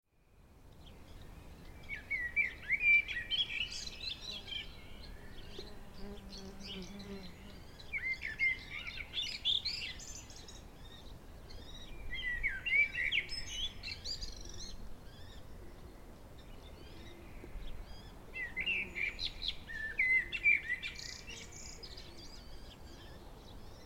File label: birdsong at meteora